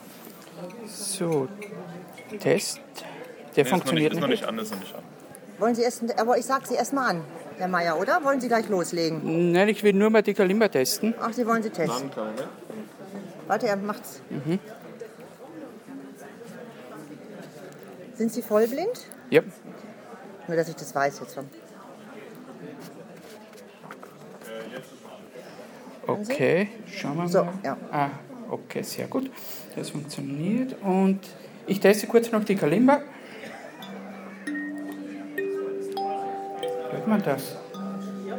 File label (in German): Kalimba Test iPhone; SightCity-Forum 2017